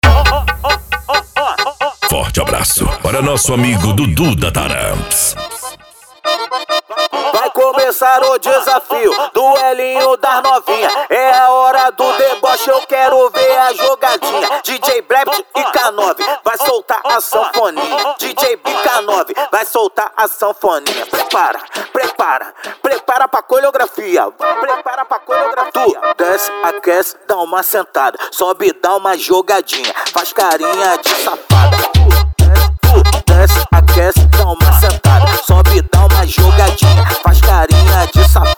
especial funk mega bass

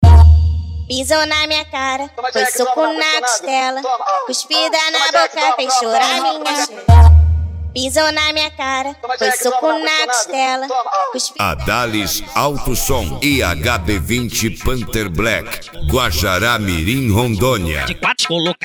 esp de cavalgada

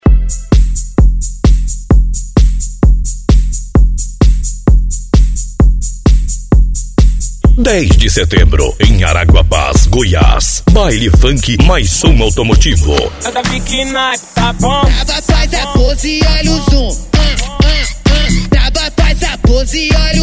baile funk som automotivo; araguapaz go

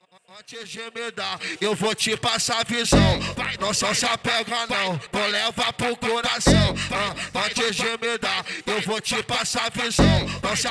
mega funk beat tum dum

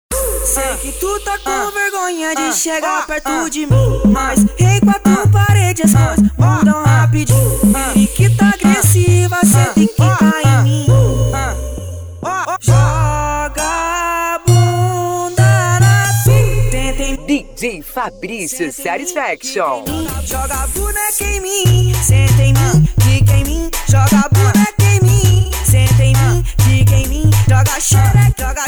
funk bass remember

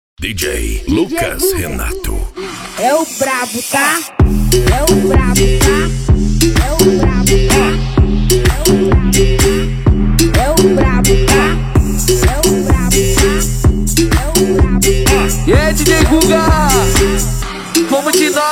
eletro funk na onda do berimbau; cd deboxe 2022

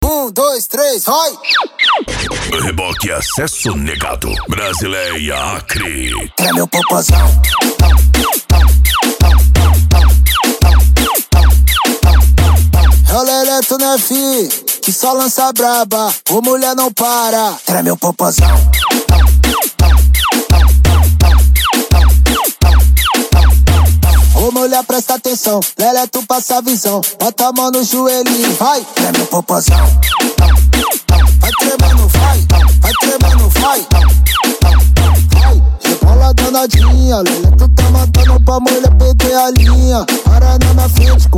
racha eletronica e funk mega bass

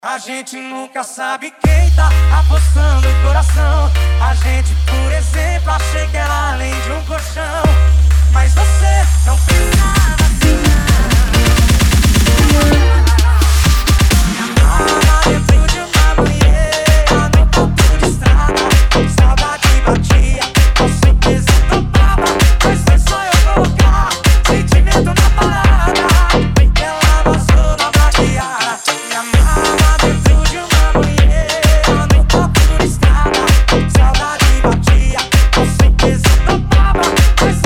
sertanejo remix

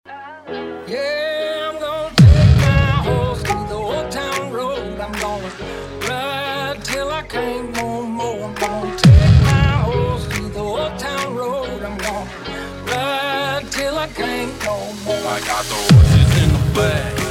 esp de pancada

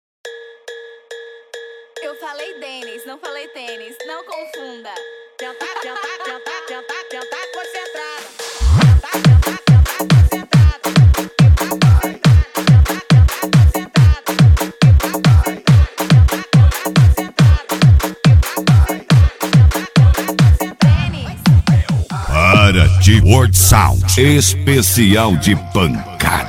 esp pancada

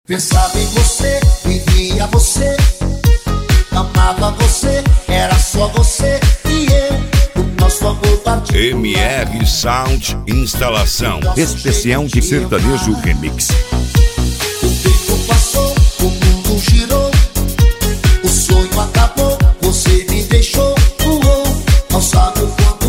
sertanejo remix

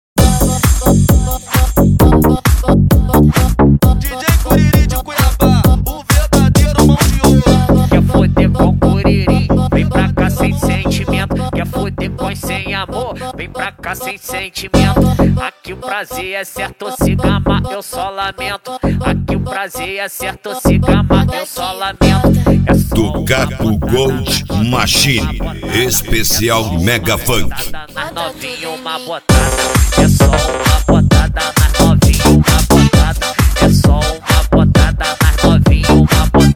mega funk

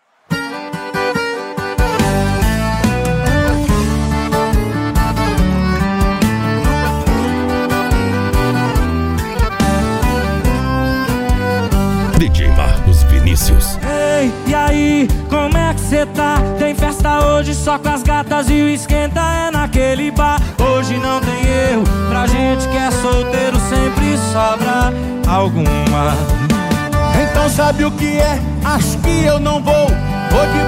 sertanejo sofrencia e romanticas

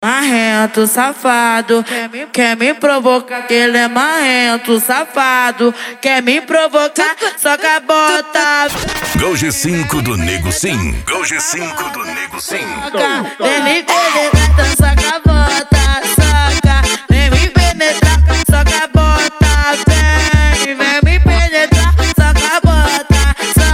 funk mega bass e noia dance

